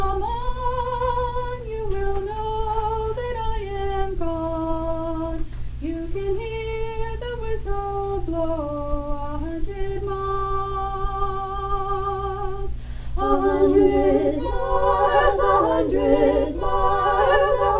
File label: trio - Our 2nd soprano on lead - Alto